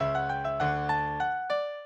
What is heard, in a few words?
minuet, mozart